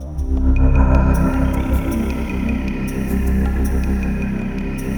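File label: wave sequence, synthesizer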